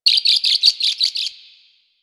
Bird Close; Ambience; One Offs; Birds; Warframe; Quests; The New War; Drifter Camp

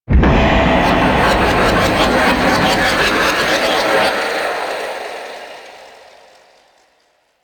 Mountain Pass Sculpture Activate; Warframe; Ambience; The War Within

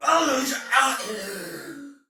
Death, Soldier Male; Sinecure

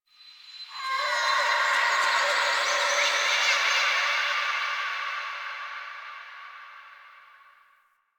Park Memory Echoes; Warframe; Quests; TheNewWar; Ambience; Zariman

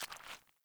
Boot Walk Water, Footsteps; Characters; Enemy; Melee Grunt; Boots; Sinecure

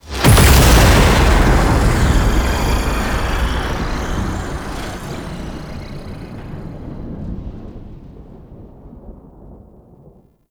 Alchemy Fully Mixed Stinger; Entrati

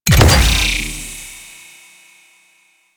Arachnoid Camper Pylon Proj Embed; Warframe; Corpus

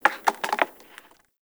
Tree Pine Branch Break; Ambience; Trees

